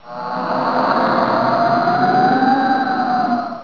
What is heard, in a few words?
die, monsters screamer; AoMClassic